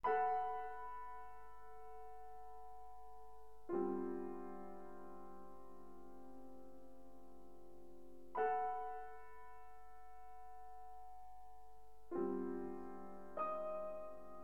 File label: Piano; Klaviersctuck Op.19 N.6